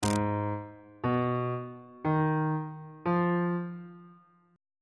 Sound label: a flat minor 6th chord intervals on bass clef